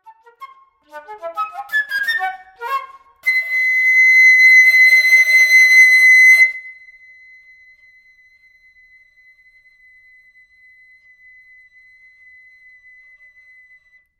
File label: whistle tones